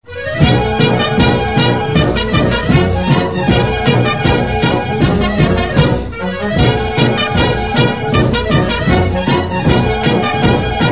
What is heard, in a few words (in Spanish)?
Marcha Militar